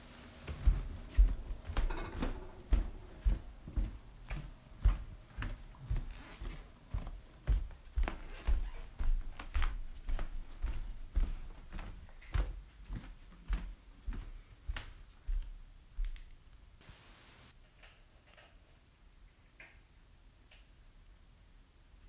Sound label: Walking on wood floor